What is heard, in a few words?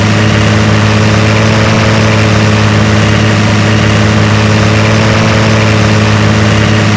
l6 diesel medium, engines; acf engines